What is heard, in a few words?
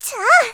attack; Eternal Return; Tia; Voice Line; KOR